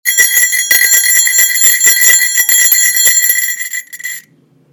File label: trailbell